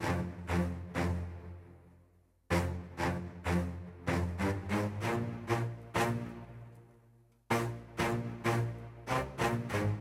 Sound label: MELODICS, viral hiphop